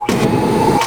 start engine

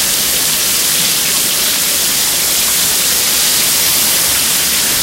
hydrant loop

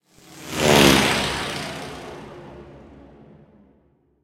plane passby layer

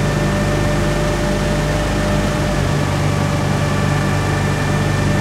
hetzer engine rpm 66, tanks; engines; War Thunder

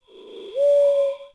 Jungle Bird; Civ4 warlords

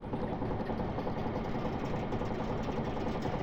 Aircrafts Rudder Right; DCS

